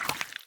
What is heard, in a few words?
step; minecraft; block